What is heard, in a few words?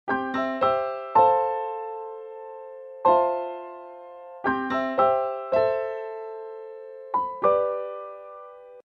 after the rain, Infinix